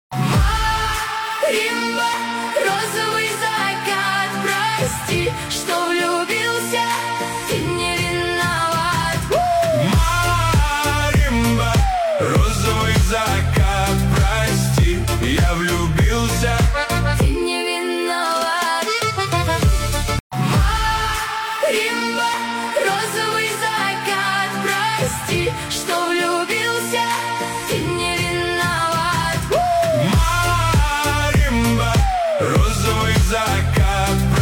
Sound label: Marimba